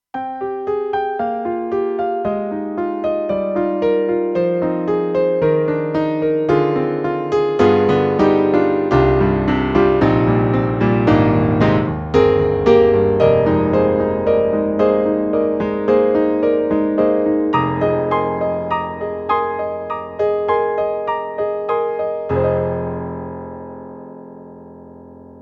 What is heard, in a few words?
Roland SC-8850 Piano 2